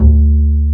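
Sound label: double bass D-2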